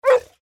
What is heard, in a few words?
dog bark